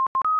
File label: remgun connect; Original TK Sounds